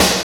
SNR RF SN, Snares; Roland - Rhythm Section; Snares 5

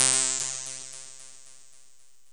FX, Dark Side Of TripHop

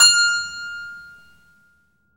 KEY HRPCR, Roland; Roland - LA Composer vol. 1

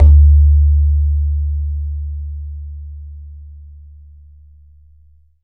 BAYAN; Q Up Arts; Denny Jaeger Private Collection

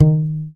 YAMAHA5 MUTE D#3, Bass

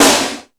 All Snares, E-MU Pro-Cussion

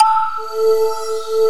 digi decay; AKAI S6000 CD-ROM - Volume 1; SYNTHESIZER

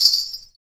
METAL, Afro Shakers, Ankle Pods; Roland LCDP14 Africa VOL-2

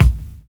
VINYL BD, Drums Dance & Groove

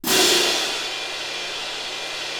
Cym Crs; Digital Sound Factory Virtuoso

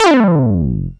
slide bass; FUNK

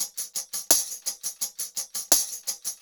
Tambourine Head, Latin Percussion; Sonic Foundry (Sony Creative Software); Joe Vitale Latin Percussion

